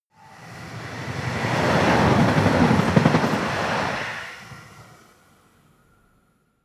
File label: train electric drive by bump